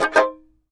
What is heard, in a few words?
tar; Roland - LA Composer vol. 3